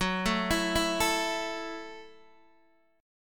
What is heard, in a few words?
Gb Minor 7th Gb, Guitar Open D